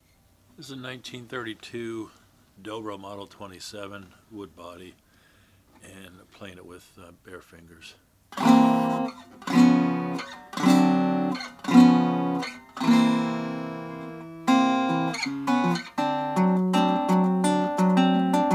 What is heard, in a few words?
dobro model 27 black